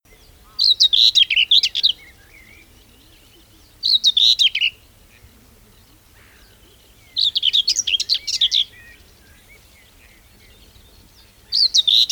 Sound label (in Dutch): grasmus zang